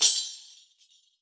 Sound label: tamb, percussion